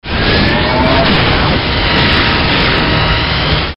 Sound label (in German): Ori Superlaser